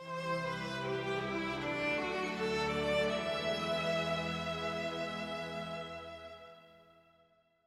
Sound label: Stinger; Building Statueofliberty